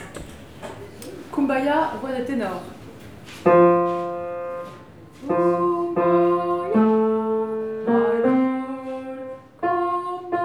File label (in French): Ténor; Kumbaya Nouvelle version